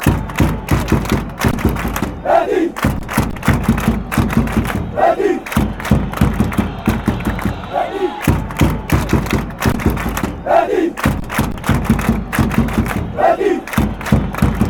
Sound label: betis betis, fan chants